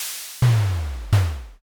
press

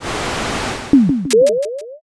gacha roll

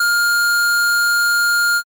ecg flat

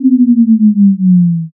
debuff

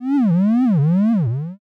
curse

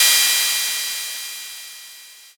cymbal

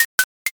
crack

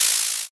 paper tear

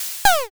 sneeze

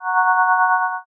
magic circle

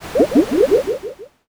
poison

